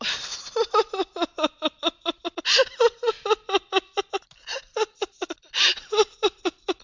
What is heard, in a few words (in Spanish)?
risa de mujer